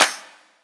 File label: clap one shot, Pop drums; Slate; SD PASADENA Pop Sample Pack